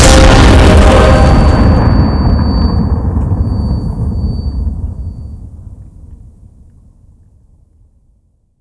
holy explosion

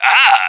whack popup; cstrike; 35hp ruso

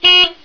car horn; jailbreak

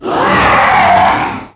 thing roar; jailbreak

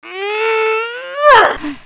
woman angry

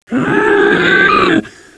charger idle

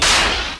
spikes jump

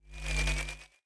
acidtrap knife deploy

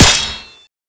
acidtrap knife hitwall

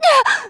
pickup; jailbreak

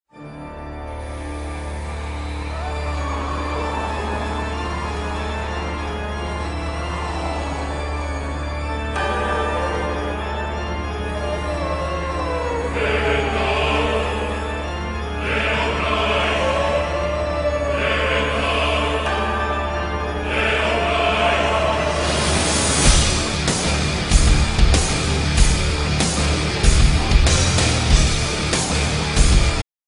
win zombie

